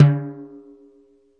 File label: TIMBALES; DRUMS (SINGLE HITS)